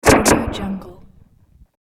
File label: starship force field effect